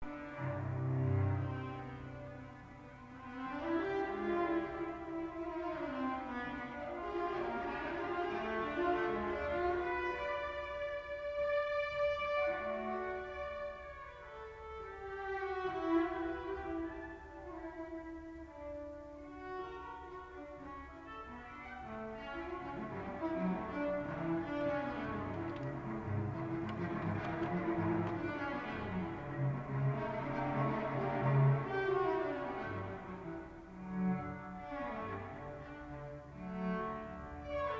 cathedral lunch time concert

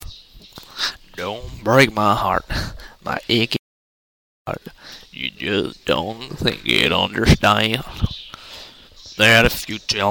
singing

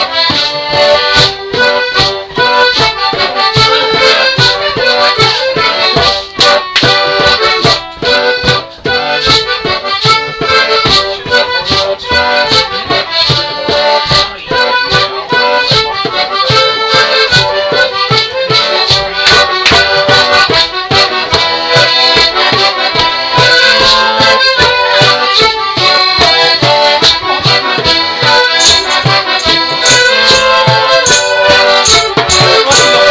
folk

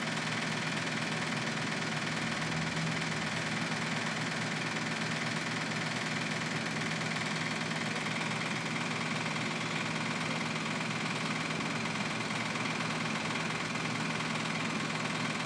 bus vibrating

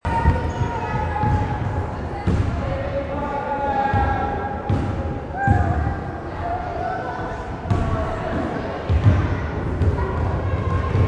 basketball in chinatown

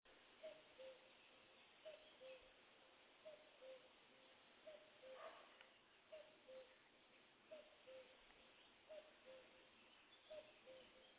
cuckoo in dordogne woods

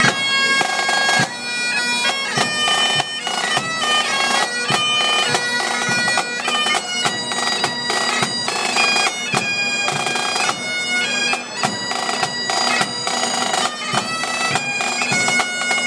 band at anti fire cuts demo; Humberside FBU

